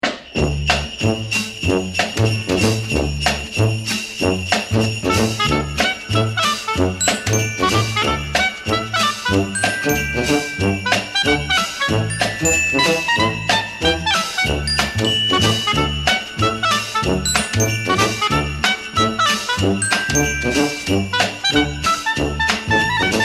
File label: Jingle Bells; Special Ed Xmas